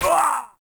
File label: Voice Enemy Hit Standard Noise; Warframe; Caliber Chicks Minigame